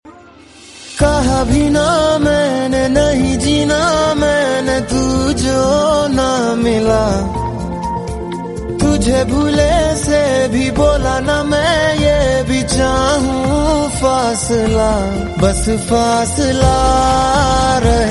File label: sad song tone ringtone, bollywood